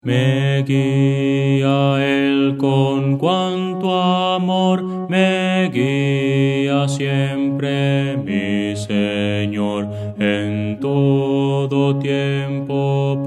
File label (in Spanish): me guia el con cuanto amor bajo